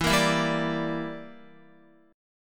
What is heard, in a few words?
E Minor 7th Flat 5th E, Guitar; Em7b5; strummed; x,7,8,9,7,9